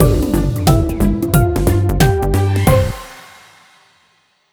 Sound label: Stab; Buzz! Junior Robojam; PS2